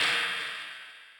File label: crash, Millenium MPS-400